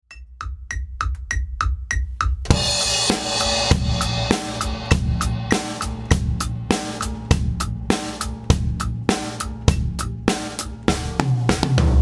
Drum

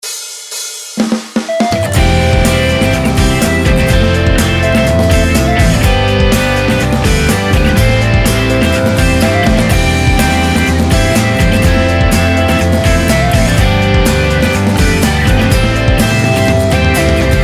2mix wet

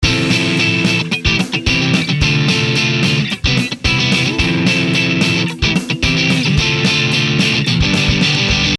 drummer; guitar

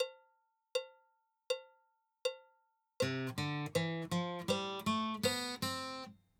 melody do re mi; guitar

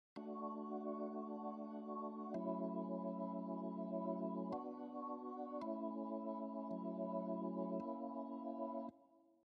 Organ

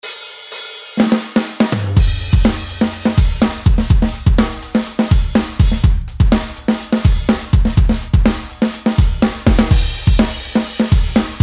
Drums wet

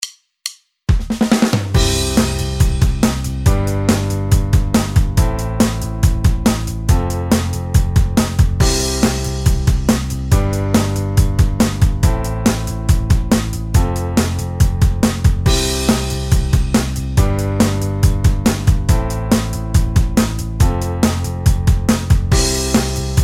High major

